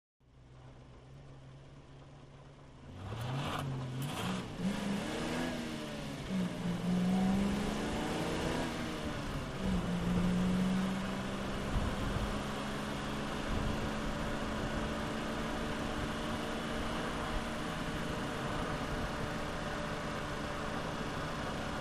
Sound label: Car Interior V8 Thru G